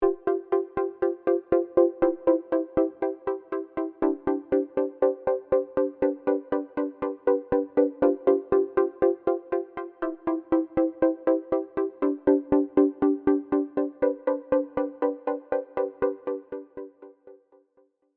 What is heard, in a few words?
Bright Edgy Pluck